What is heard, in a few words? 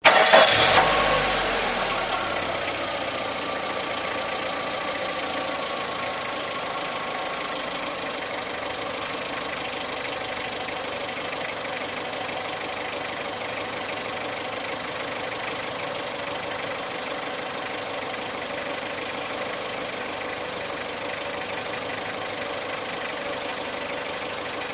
Sm Car Eng Idle Off, compact